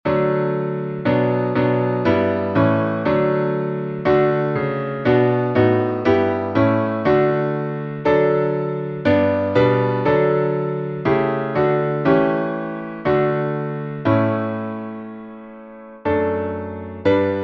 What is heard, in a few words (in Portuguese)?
salmo playback